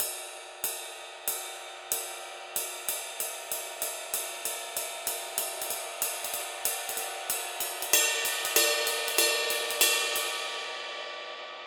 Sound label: PAISTE TWENTY MASTER DARK CRISP RIDE